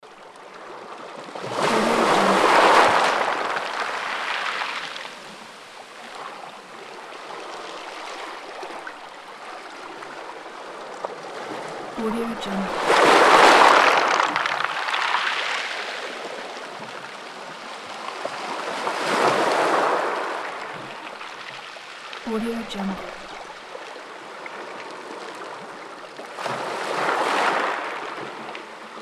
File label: ocean waves; davidbeardmusicproduction